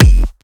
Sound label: Kick, Dance Drum Kit; Drum Oneshots; Twilight; Monosounds